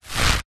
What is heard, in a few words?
rope stress tighten creak fast; foley; zapsplat